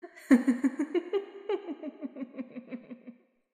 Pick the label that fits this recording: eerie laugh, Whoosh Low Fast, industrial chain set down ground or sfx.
eerie laugh